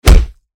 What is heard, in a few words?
death